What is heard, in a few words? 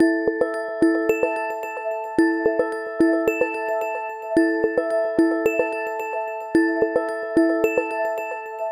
Multiple Alarms; homepod OS